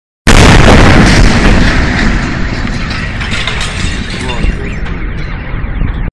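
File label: explosion sound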